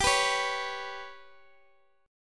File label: G Major Flat 5th G down Guitar DADGAD